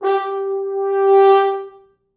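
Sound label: French horns, four, g3; East West; Quantum Leap Horns Sax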